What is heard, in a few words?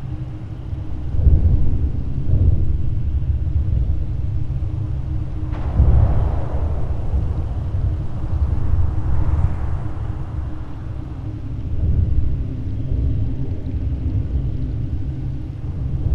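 Ambience Loop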